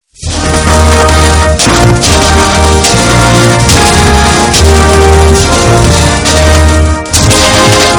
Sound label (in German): deutsche nationalhymne